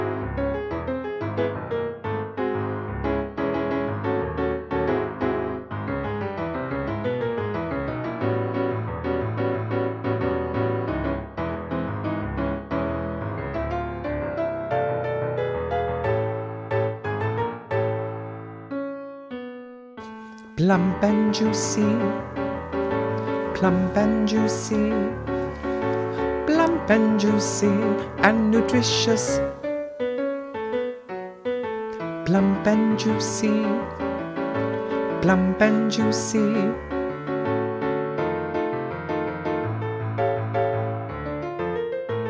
audition piano and background vocals accompaniment